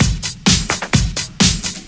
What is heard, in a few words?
Straight Loop 128 BPM, House